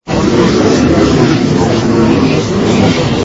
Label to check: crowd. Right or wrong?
Right